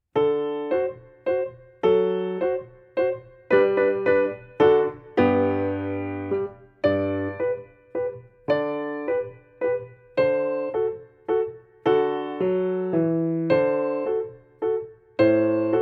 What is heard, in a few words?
Wooden Shoes 108